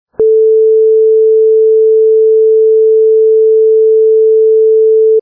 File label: Sine Wave